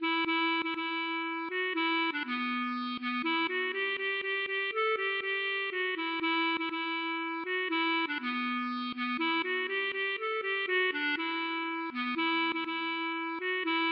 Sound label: mazurka